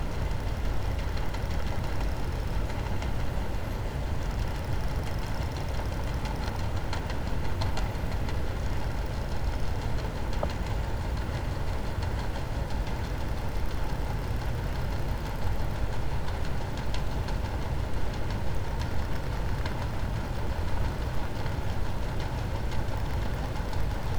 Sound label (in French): cachalot negatif, Physeter Macrocephalus